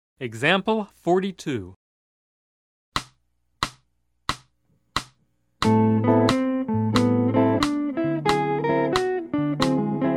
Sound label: Jazz